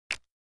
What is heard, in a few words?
Card Toss On Table; casino games